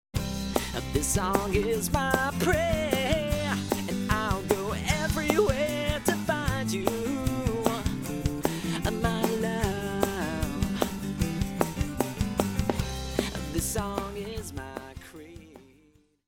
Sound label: my creed rough mix; Here's what the clip I used sounds like originally, before the mastering phase